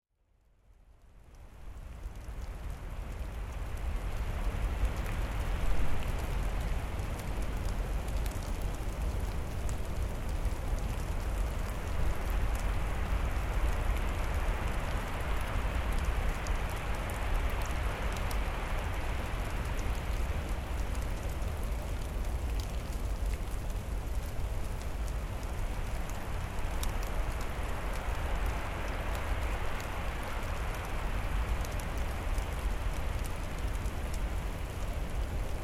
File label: Fog Surf